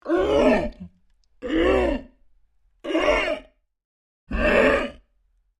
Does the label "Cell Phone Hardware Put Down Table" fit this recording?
No